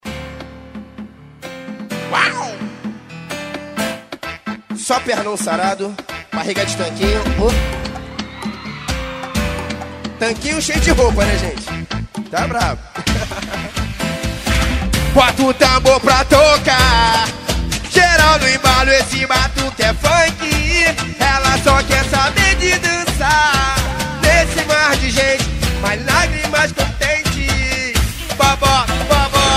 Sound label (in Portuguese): ao vivo, malha funk